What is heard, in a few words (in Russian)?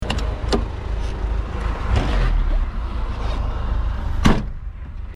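zhiga seli, zvuk avtomobilia zhyguli lada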